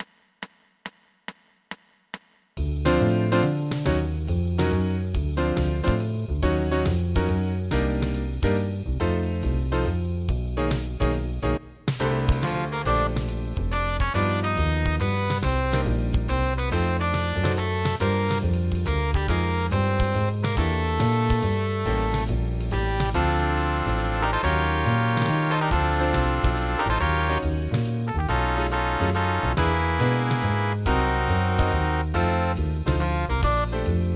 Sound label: Septet